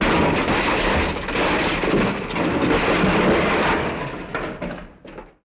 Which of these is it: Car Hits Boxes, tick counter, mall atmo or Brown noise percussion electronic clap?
Car Hits Boxes